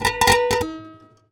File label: Classical Guitar Extended Technique